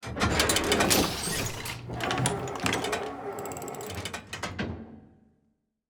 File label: Music Box On